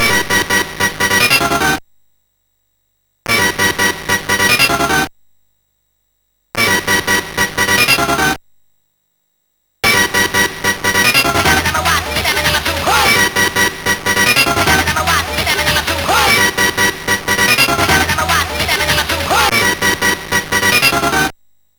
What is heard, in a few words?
intro sid, MOS6581R4; Miracle 8; hvsc